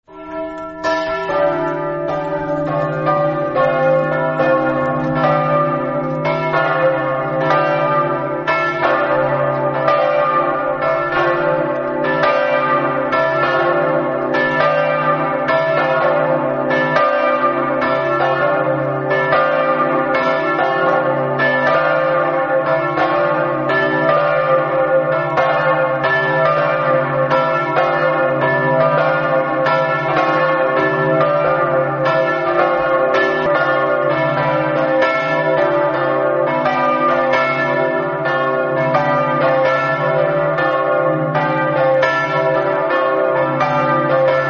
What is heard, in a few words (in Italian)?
campane; reana